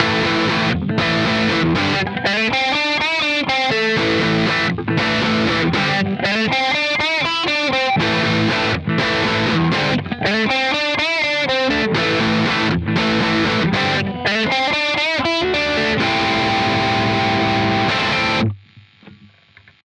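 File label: British High Gain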